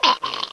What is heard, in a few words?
drown; video games; source engine; tf